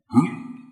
windup

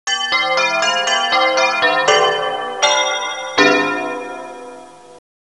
Bells Of Gold; Eastern Mind The Lost Souls of Tong Nou

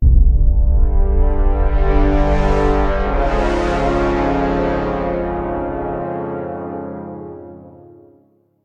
Outside the Clocktower

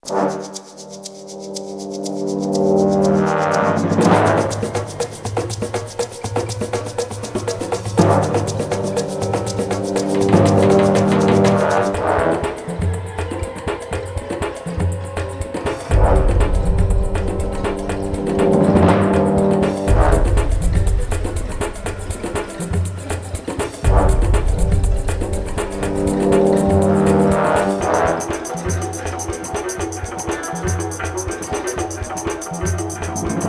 tension stinger; Impossible Creatures

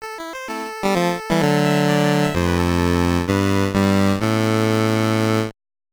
Victorious Level Up; just breed nes; Army Joined

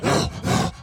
Enraged Breathing Loop

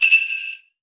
christmas bell large; Angry Birds Seasons

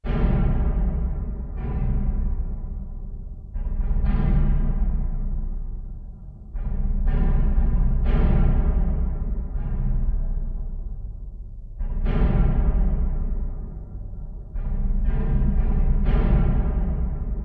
Jungle Drums; Age of Conan Hyborian Adventures; Roaming Gorillas (Heat of the Jungle Drums)

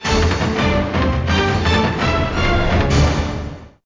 Bgm Boss Clear; Ngds

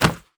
enemy death land leg

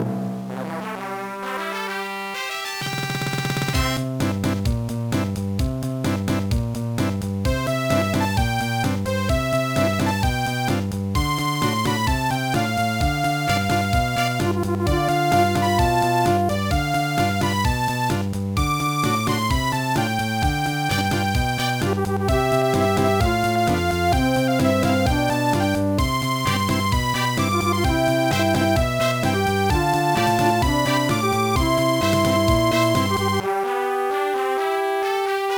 BGM; Taiketsu Ultra Hero; GBA

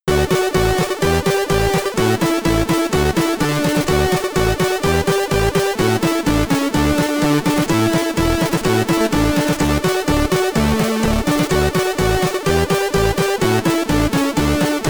SID Stereo, commodore 64; Eskimo Games; Operation Snowball

